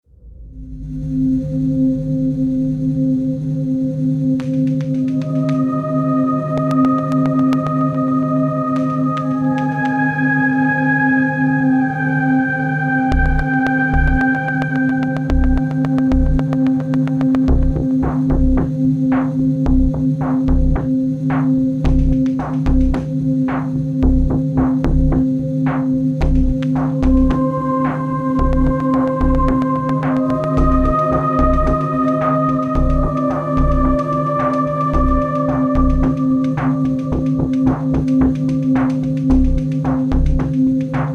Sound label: Tension